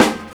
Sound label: SD JAZZ; E-Lab; X Static Goldmine 2; akai